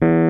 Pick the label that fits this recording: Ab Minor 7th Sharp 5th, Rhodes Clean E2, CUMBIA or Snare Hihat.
Rhodes Clean E2